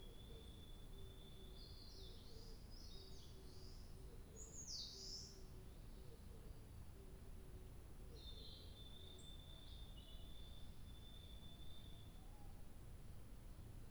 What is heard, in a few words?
birdsong